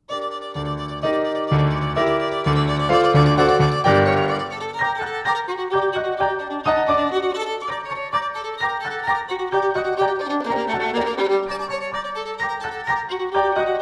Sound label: Violin